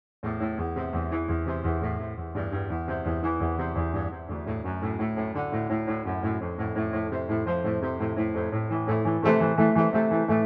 Sakura Plucked

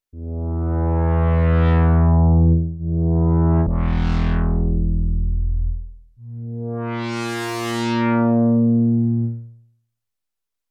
Solo with Novation PEAK

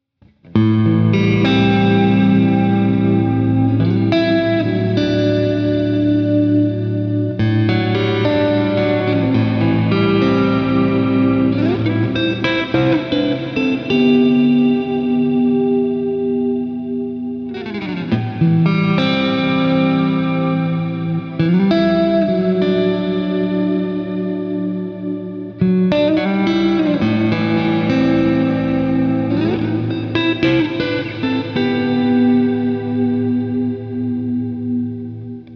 Choral Verb

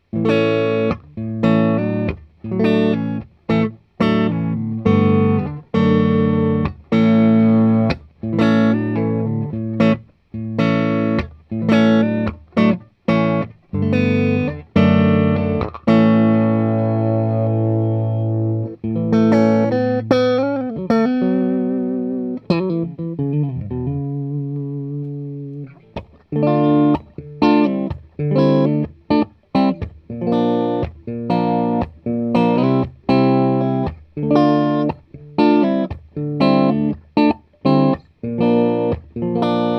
Vox MV50 Clean EQ Deep

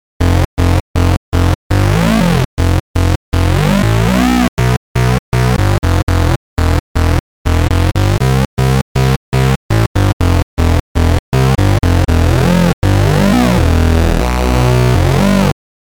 saw bassline; bx oberhausen

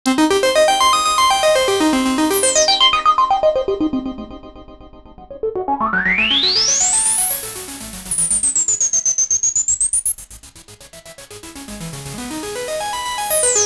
Cascade 8 Steps C Cutoff; PulsLV

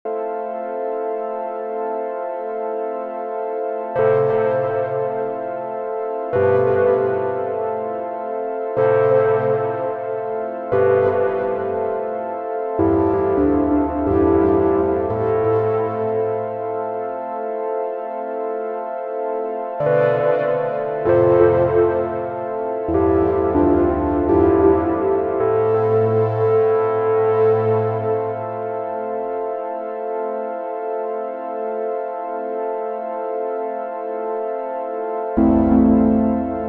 MicroMonsta 2 Bitimbral Trident OddKey

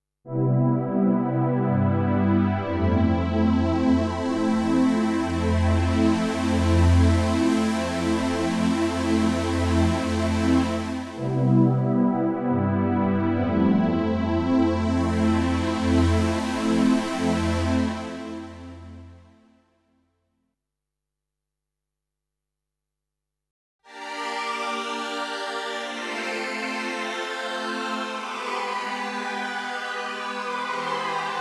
MX49 Synth Pad

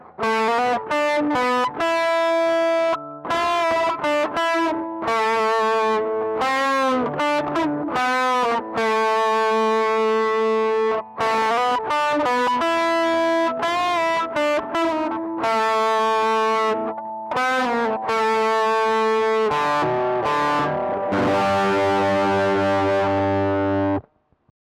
Tele Dist

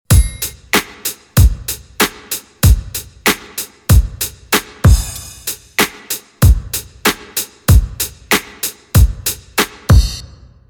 Behringer X32 Vintage Verb

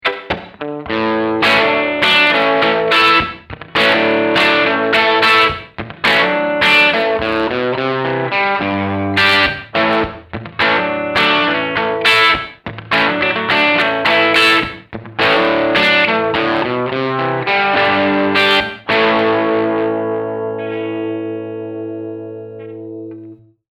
Booster

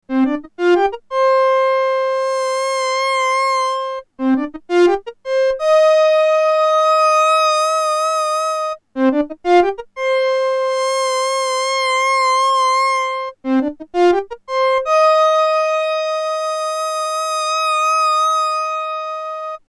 cs80 dry pulse 16 saw 8

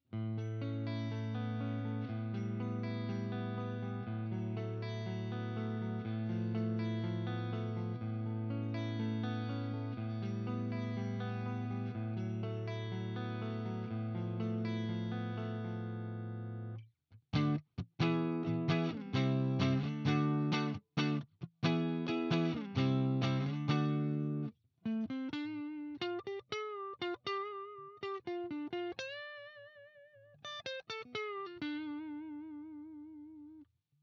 HB Fusion-T clean neck split

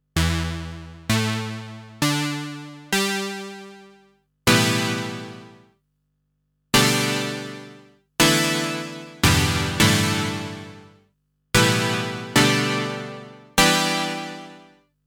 SE70 Synth Lead

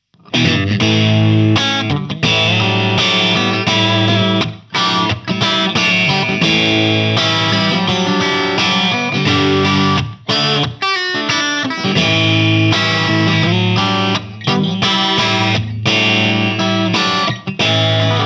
Harley Benton Mighty 15TH Clean Single Coil

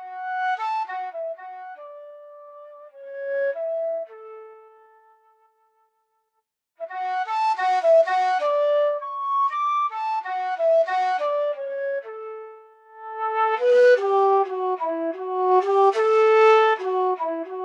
Yamaha YDS-150 Shakuhachi

